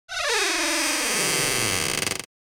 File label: Old scary wood door opening